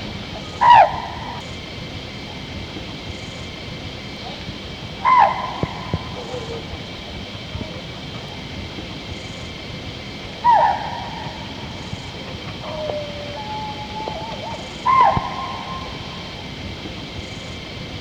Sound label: chital alarm call, Dudhwa NP